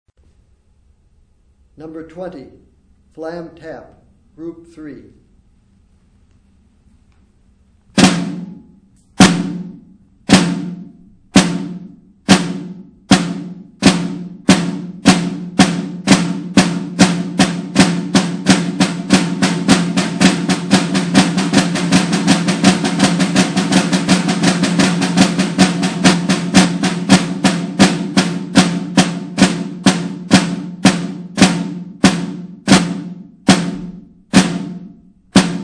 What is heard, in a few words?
snare rudiment